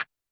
percussion, Roland TD-20

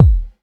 BD, Dance Mega Drums; 90 s Sample CDs; Best Service

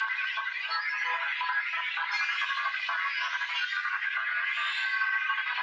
Tonal Re Draw, sparse soundscape; Loops

